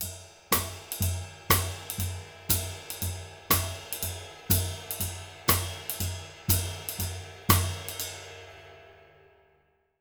120 BIGBAN; Univers Sons; Jazzistic

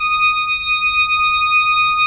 D#6, PIPE OR; AKAI S-Series CD-ROM Sound Library VOL-2

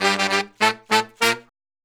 Horn Section; Traditional; Sonic Foundry (Sony Creative Software); Jazz Solos and Sections

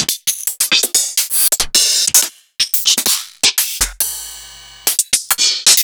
Open Hihats

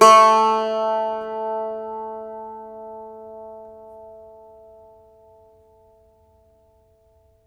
IRISH BAZ, Plucked Strings, Irish Bazouki; E-MU Producer Series Vol. 7 – Old World Instruments

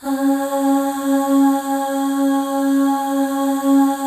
Voices, FEM AHS; Spectrasonics; FEM.2 AHS

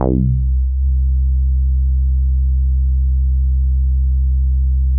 Synths BASS

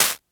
Noise Shake, Perc; Lost Tapes From Mars

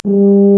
tuba, brass; Solo Brass

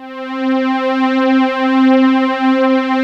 string pad; InVision Interactive; Lightware VOL-1; Instruments & Percussions

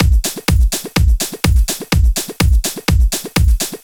Drum Loops, 125 BPM; Sonic Foundry (Sony Creative Software); Underground UK House & Electro

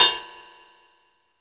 la ride h, Roland D-10 L.A Drums

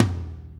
DANCE SET, DRUMS

